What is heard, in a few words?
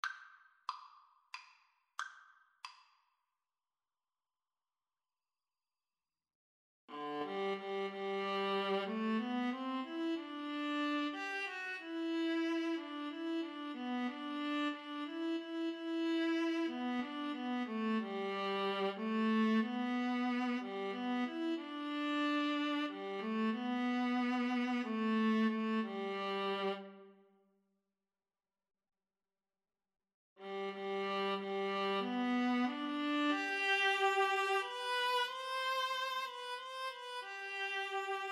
viola viola